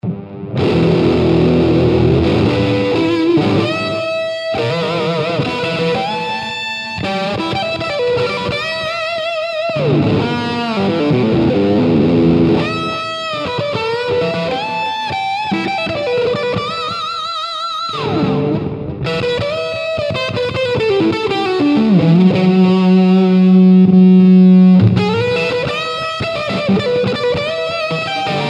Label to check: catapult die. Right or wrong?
Wrong